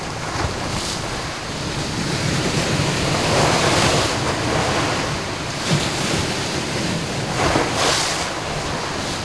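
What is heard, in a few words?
OCEAN WAVE, NATURE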